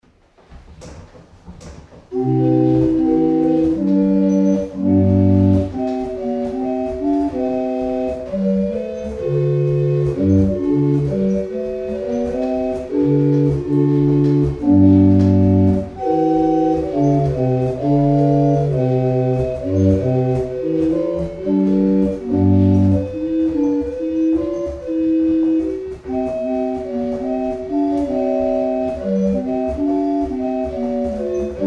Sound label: Portuguese Hymn, barrel organ; Boxford